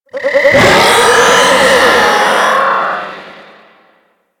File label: Pet Vocal Call; Enemies; Vocals; Warframe; Alad V Pet